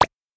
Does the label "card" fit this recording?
Yes